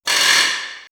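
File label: Malfunction, electronic fx; X-Static Goldmine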